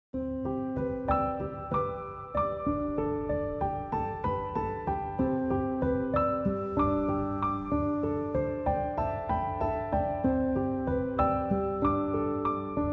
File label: piano instrumental